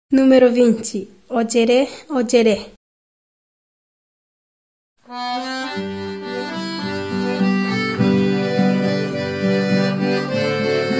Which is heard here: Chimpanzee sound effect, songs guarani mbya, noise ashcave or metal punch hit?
songs guarani mbya